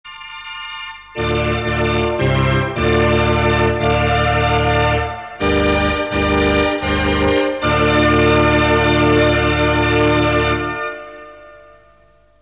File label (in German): Orgel; Sinclair